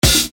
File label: Hat, EuroDance